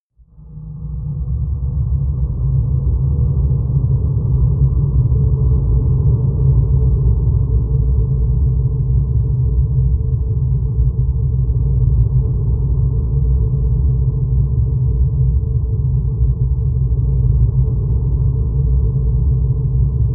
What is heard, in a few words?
drone tumbler